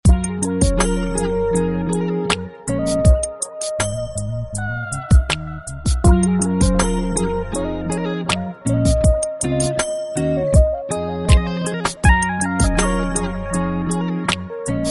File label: Slowly, phonetones Samsung